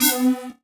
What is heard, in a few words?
Multimedia Internet CD-Rom Flash Beep Mechanical